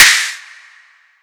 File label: clap add